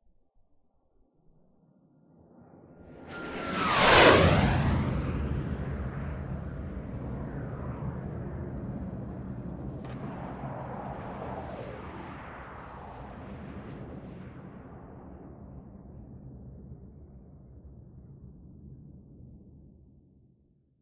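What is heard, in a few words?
Gnat By Low Over Canyon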